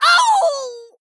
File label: Female Dwarf Death